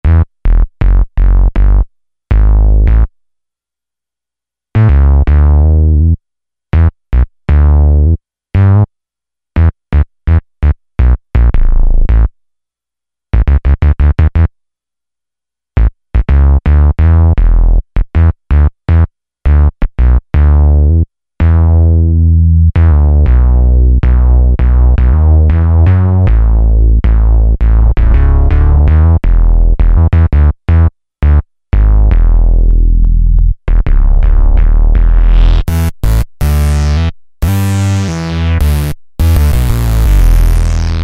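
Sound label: Funk Bass, Roland V-Synth; Factory Patches; StormyFunkBs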